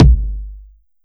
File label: rich mids bass drum sample g key